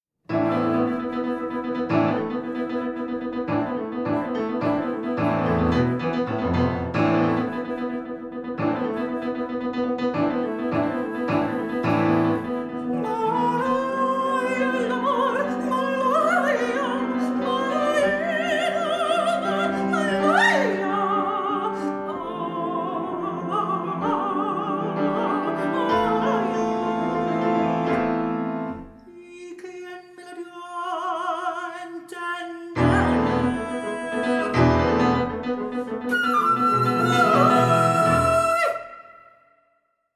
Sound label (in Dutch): polo flamenco lied